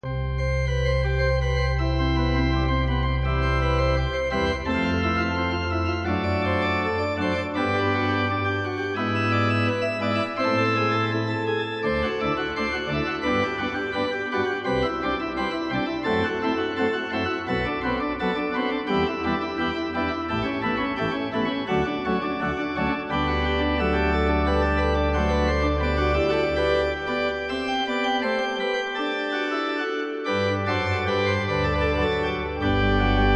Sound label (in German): Präludium C-Dur; BWV 553; Bach